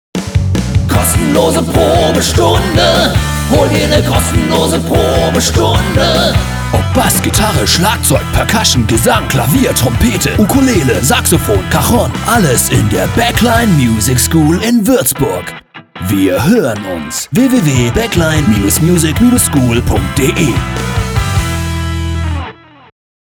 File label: Backline